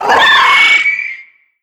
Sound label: death, headcrab reviver vox; creatures